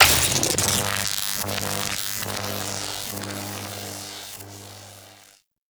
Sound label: Spear Throw Tether Activate B, Weapons; Warframe; Corpus; Spear Gun